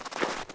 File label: walk snow